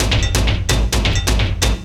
drum 130, techno trance drumloops

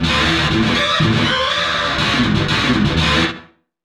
GTR LOOPS

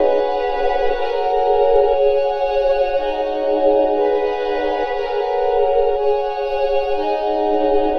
Pad, Trance

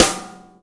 SNARE, Ensoniq ZR-76, Drum Machines; GADD SNARE 2; 300 Drum Machines

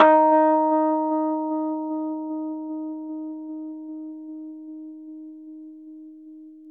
Rhodes 73 D#; E-MU Producer Series Vol. 5 – 3-D Audio Collection; 3DRhodes

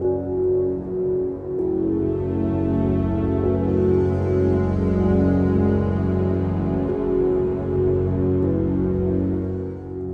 Ground Loop, Acoustic Drone; Sonic Foundry (Sony Creative Software) - Friendly Fire; DroneLoop

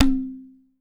MIRDANGAM

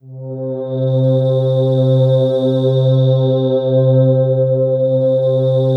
PAD, Drum & Bass, 160 BPM; Ueberschall; Drum & Bass Flash Trackz

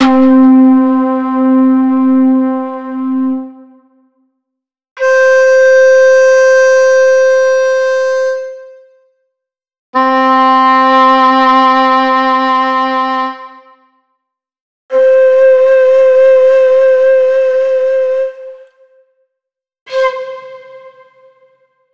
Flutes, monosounds

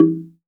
LOG DRUM LOW, Drum Machines, Akai MPC-3000

